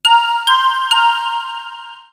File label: soft message alert ringtone; android